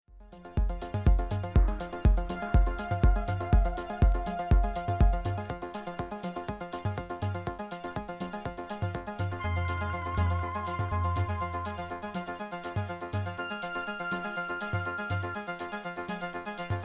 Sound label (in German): Stanton T.92 M2 USB Presonus Interface orig. Abnehmer